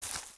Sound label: FS Weeds, footsteps